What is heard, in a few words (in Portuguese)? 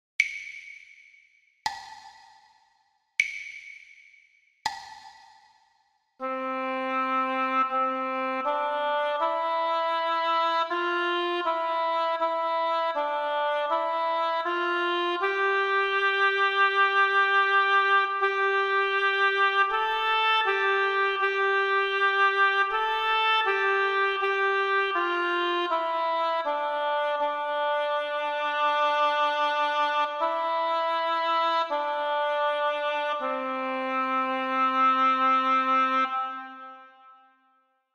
percepcao ditado